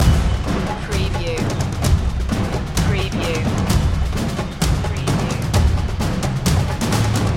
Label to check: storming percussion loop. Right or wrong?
Right